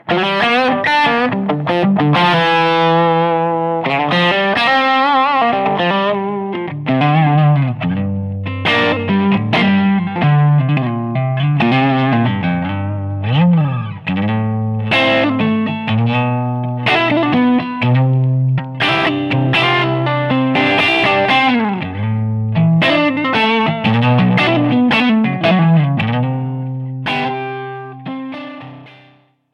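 N pickups Closed back 4x12 cab Gain 10 Boost off Treble 12 Middle 13 Bass 13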